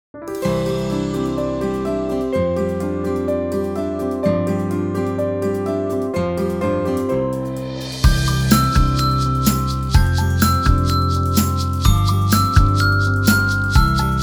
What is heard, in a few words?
CHIME BARS with ACCOMPANIMENT